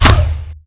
RESPAWN; svencoop; aliens items

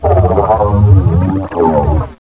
shock trooper die; svencoop; cryocell

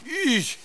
clone, pain; svencoop; paranoia

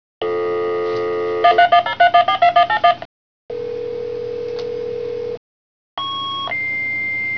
office ambience; svencoop; buro

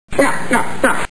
nyuk; valve; 3stooges

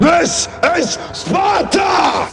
spartaaa

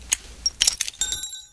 grenade prime; weapons

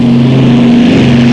c-47 dakota; tfc

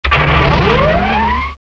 success sound

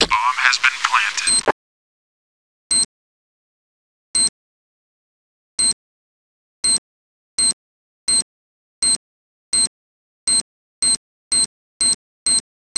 c4 plant; The Dust

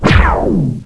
uber laser; svencoop; oxysound

